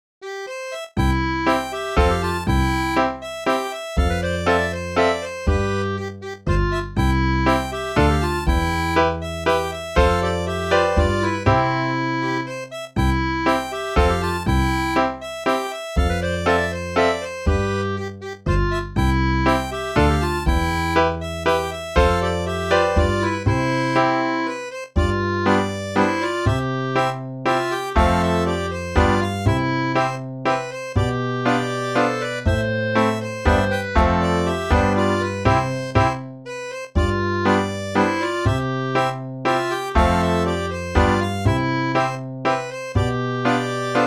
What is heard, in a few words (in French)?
mazurka